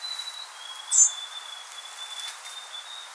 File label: white sparrow